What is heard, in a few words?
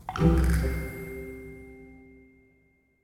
small win; wingsofriches